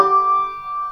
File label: combo power; tetrio